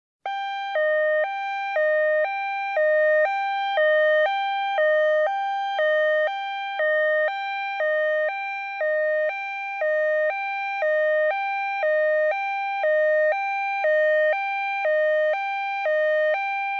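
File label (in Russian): звуки сирены полиции и пожарной машины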